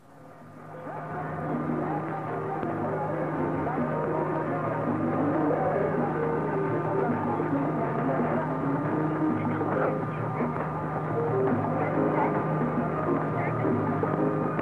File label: mwdx 1120 khz; ondas del lago maracaibo